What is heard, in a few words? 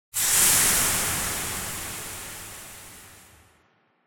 steam release; Storage Hall